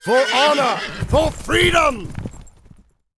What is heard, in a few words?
Knight War cry; warcraft3